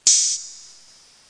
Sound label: Simbal